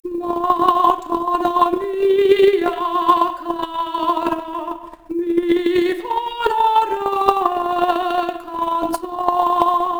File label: Soprano Stereo output noised, 3bit